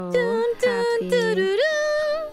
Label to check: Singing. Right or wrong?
Right